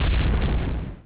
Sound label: explosion; robobombo